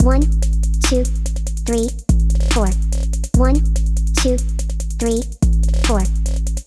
32 beat trap

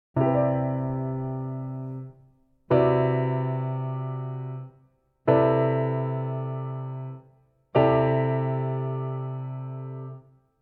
voicing variation

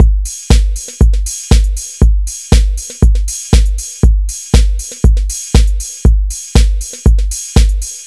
electro drums

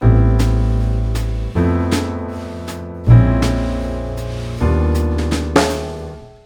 chord scale complexed

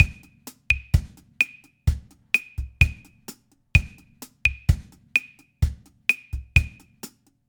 son clave

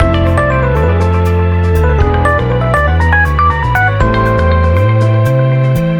lydian b7

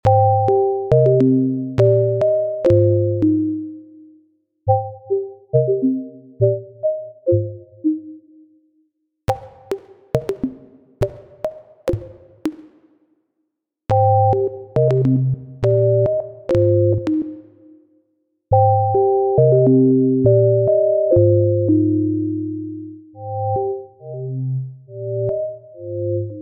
sine comparison

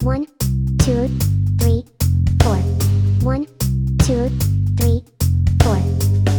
backbeat reggae

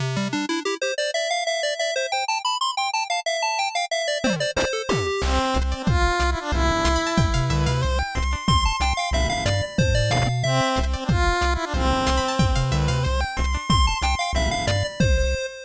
scale comparison major

